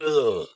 orc death; 3D Game Programming All in One

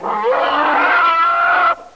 howl; alienbreed3d2

